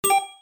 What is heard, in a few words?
token add; slots; gangsterallstar